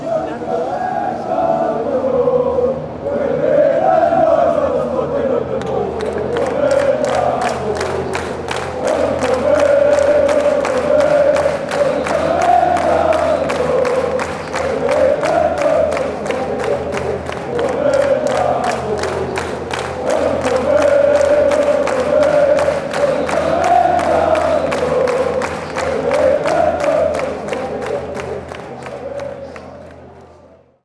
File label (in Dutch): lokeren zingen